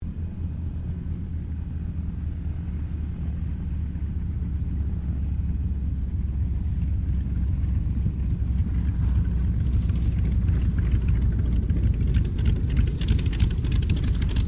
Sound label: AIRCRAFT T-28D TROJAN LAND; Airplanes; Vehicles